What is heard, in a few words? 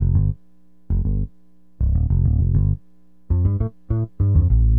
BASS, Acid Jazz Funk